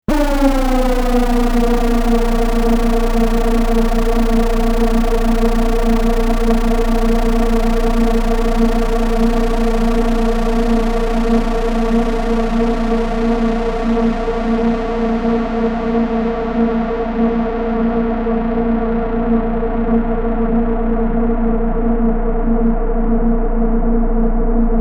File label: Drone; LAMM RawArtistic PhilKullmann; DRONES EARTH